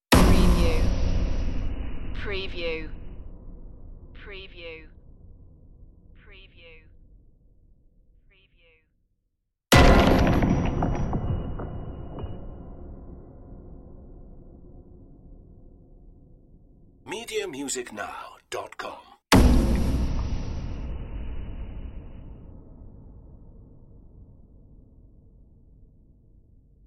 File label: dark impacts